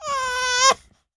CRY, baby

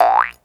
JEWS HARP, cartoons

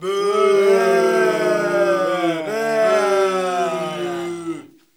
BOOOOO

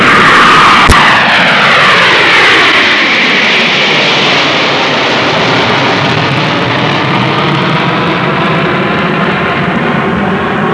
CONCORD, aerei; trasporti

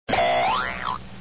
Boing, cartoons

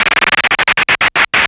SKID, cartoons

